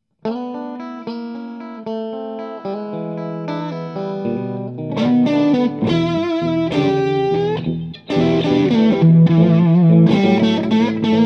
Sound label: blues, guitare